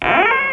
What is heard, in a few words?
garden keeper attack